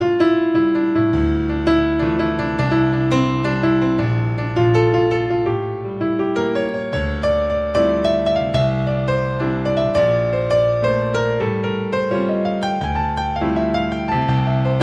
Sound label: Piano